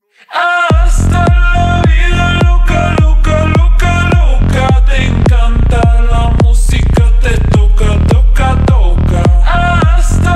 techno slowed reverb

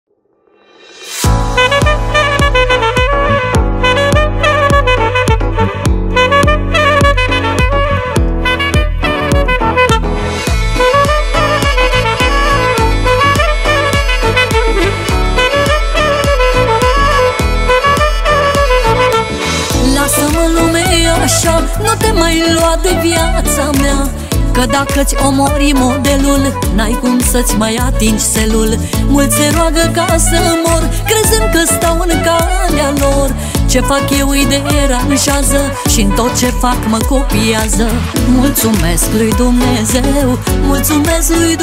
sax

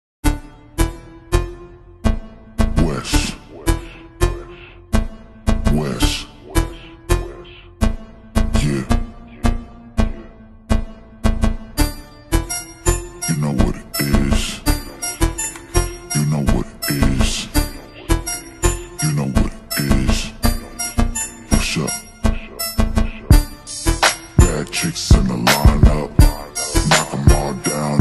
slowed reverb

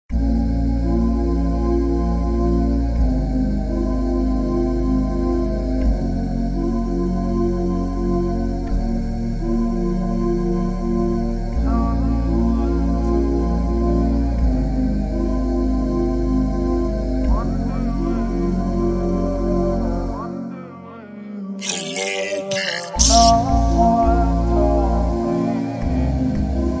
slowed down version nasheed background

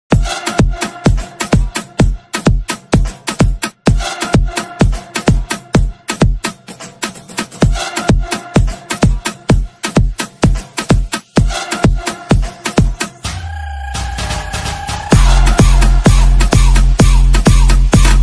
party remix dj song

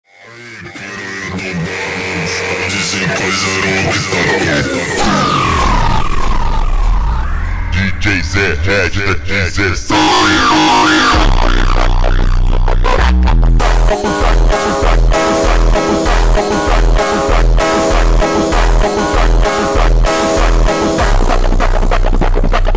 ultra slowed reverb

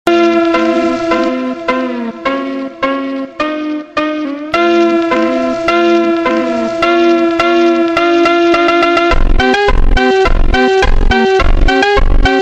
funk super slowed

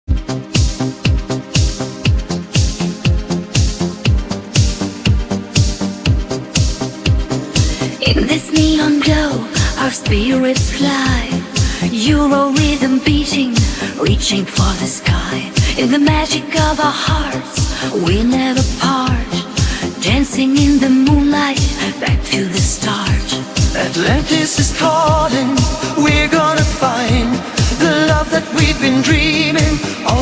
italo disco 80s

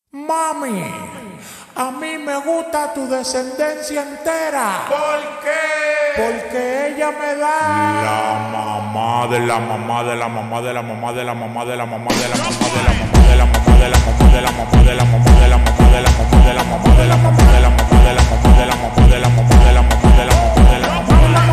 slowed reverb bass boosted

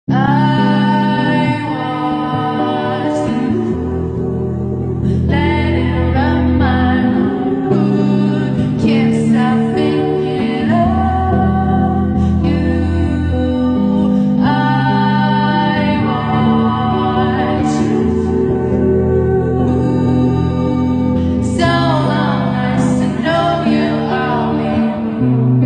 slowed acoustic

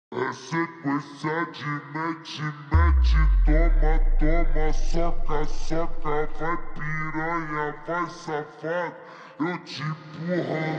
slowed reverb

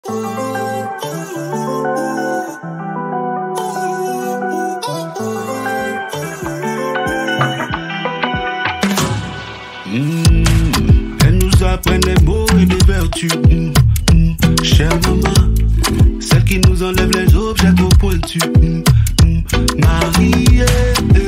bass remix

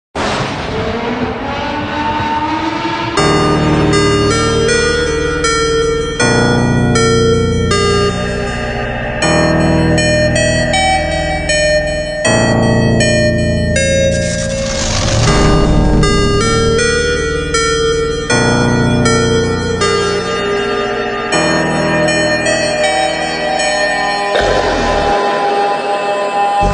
ultra slowed reverb

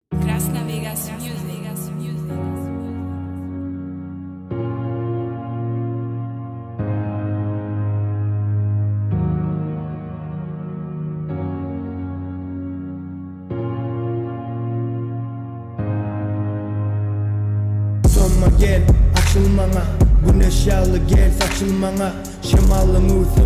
bassboosted reverb

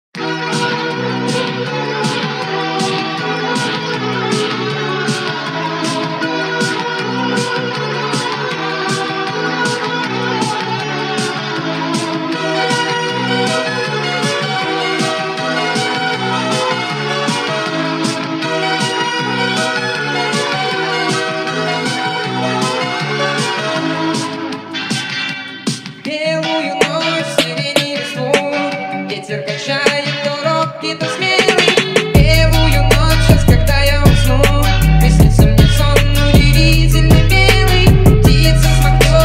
phonk

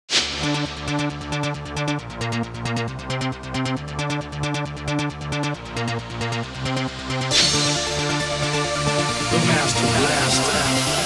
new mega dance hit

